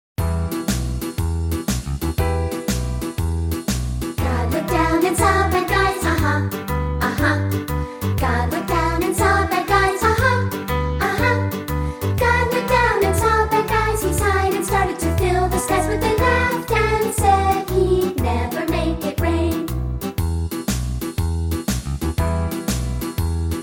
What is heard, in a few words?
Childrens Choir